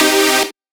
synth shot C; Electro; Anthemic; synth shots

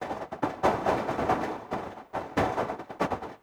TEKNO 140; Techno

